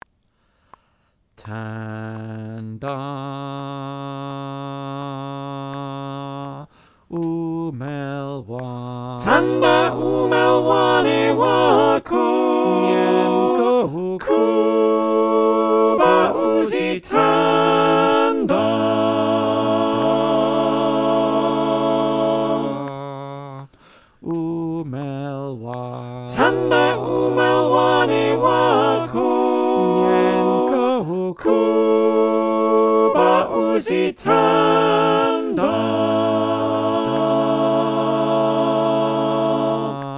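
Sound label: soprano alto tenor bass, choir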